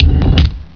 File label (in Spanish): boton